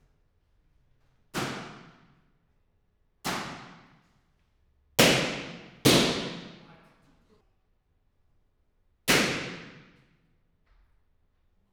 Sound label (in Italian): Palloncini BIN